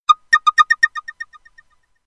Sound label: Subtle Sms